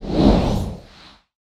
Spear SWING heavy; Cielonos

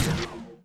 magic cast life; MagicArsenal

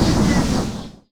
storm impact; MagicArsenal

